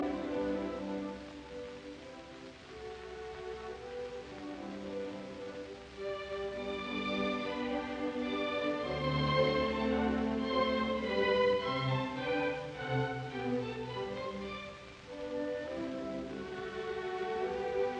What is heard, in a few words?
Grand Overture in D major Andante; J.C Bach; Op 18 No 4; Carl Schuricht